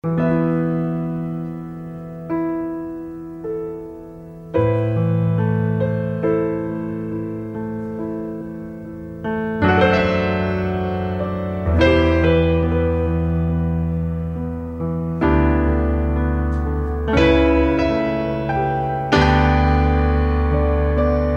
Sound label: instrumental